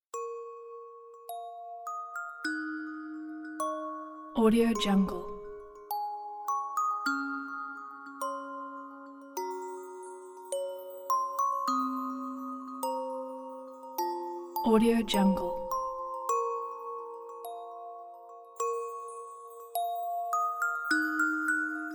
fairy story lullaby music box

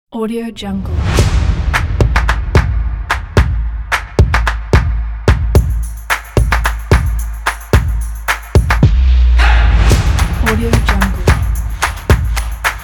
percussion soccer

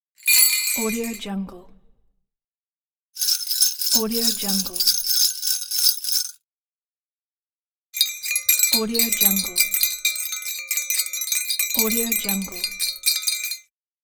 bells

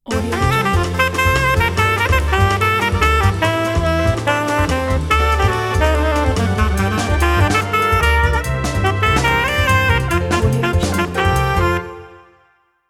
cheerful latin sax logo

